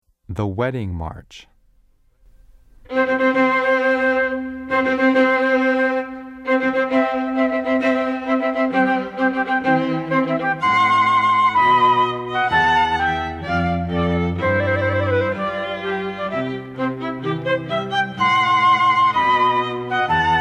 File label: wedding march